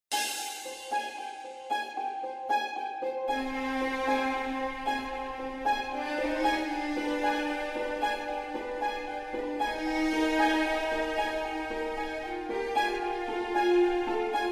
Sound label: Dungeon Music; Prototype